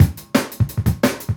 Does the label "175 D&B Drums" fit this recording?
Yes